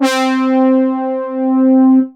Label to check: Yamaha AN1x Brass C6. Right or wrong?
Right